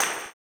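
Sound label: tambourine, 160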